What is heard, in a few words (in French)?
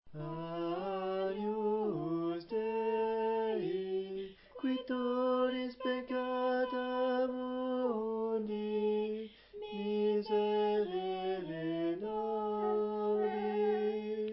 Voix de ténor; agnus st Jean